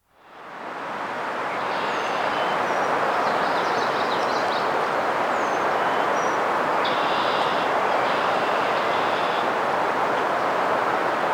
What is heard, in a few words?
Wind In a Pine Forest; Dr. Sound Effects; 210 Nature Sounds - 20 Hours of Relaxing Natural Ambiences for Meditation and Sleep